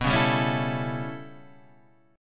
A 7th B, chord; A7/B; strummed; JGuitar

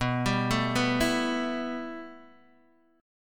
B 7th Suspended 4th Sharp 5th, Guitar, Open D

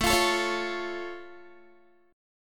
A Augmented A down Guitar; A+ strummed

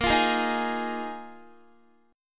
Bb 7th Suspended 4th Bb Guitar 4E,4A,5D,5G,5C,6F

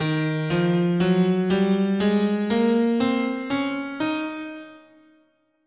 D sharp Bebop Minor, scale

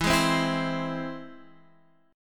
E Augmented E down Guitar Half Step Down

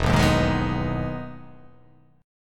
E Major 7th E down

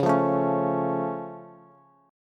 Db Augmented, down, Bass; strummed; JGuitar

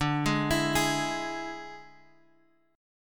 D Suspended 2nd Flat 5th D; Dsus2b5 Chord {X 5 6 X 5 4} arpeggiated

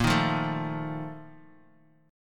Bb Major 7th Suspended 4th, chord; 3,3,1,0; BbM7sus4 strummed; JGuitar

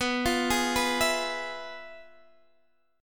F Minor Major 7th Flat 5th, B

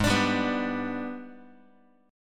Ab Minor Major 7th Flat 5th, down, Guitar; AbmM7b5; strummed

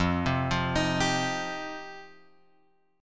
F 7th Suspended 2nd; F7sus2 Chord {1 3 3 X 4 3} arpeggiated

